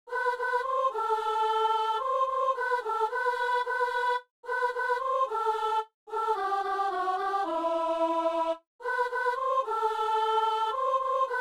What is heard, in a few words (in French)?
vent de l esprit vent de printemps, soprano